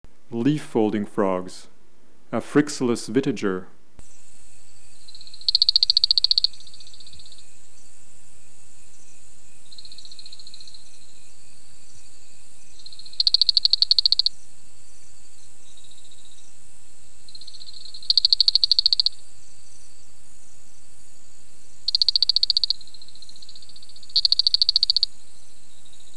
Afrixalus vittiger

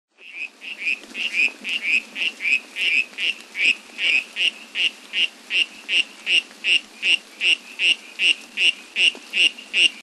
Boophis ankaratra

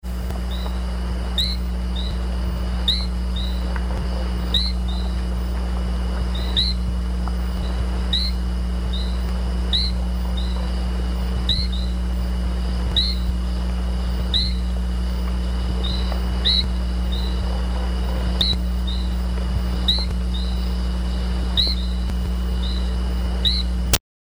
Adenomera bokermanni

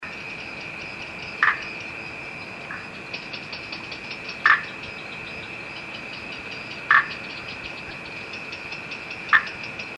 Guibemantis timidus

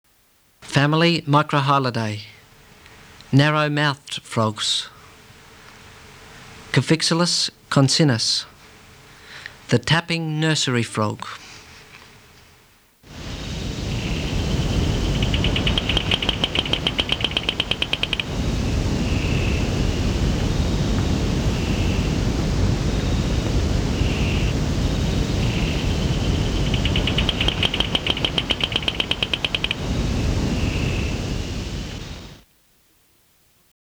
Cophixalus concinnus

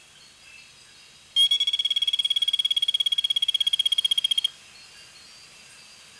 Oreophryne equus

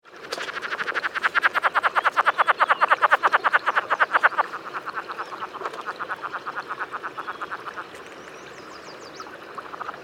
Rana cascadae

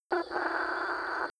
Bokermannohyla napolii

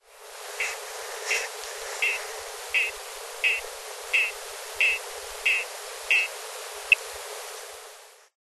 Boophis laurenti

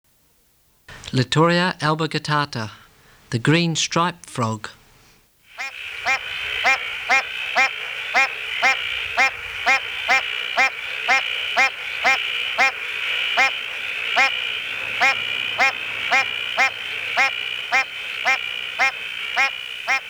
Cyclorana alboguttata

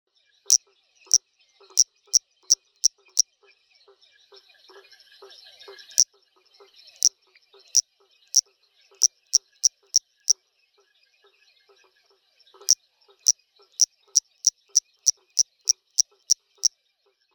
Dendropsophus sanborni

